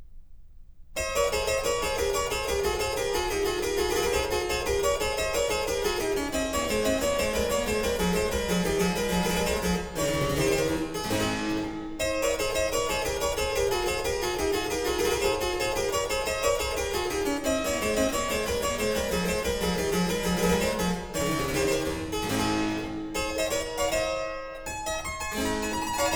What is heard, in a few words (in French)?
pour clavecin seul; Jean-Philippe Rameau; Christophe Rousset, Ryo Terakado, Kaori Uemura; Pièces de clavecin en concerts; Cinquième concert; L'indiscrète